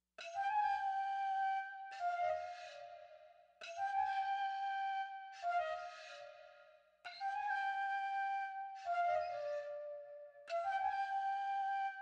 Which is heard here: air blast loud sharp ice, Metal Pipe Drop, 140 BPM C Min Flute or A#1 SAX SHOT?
140 BPM C Min Flute